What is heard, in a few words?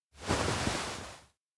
slump; anemoi